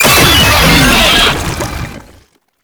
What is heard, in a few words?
Proto Infested Brachiolyst Death, Vocals, Enemies